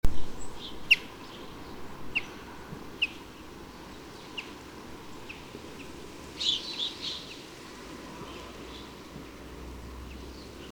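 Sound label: chaffinch coelebs, flight call; kueborn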